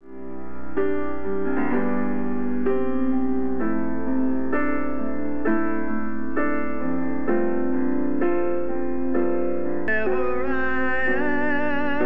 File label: CHILD INSTRUMENTAL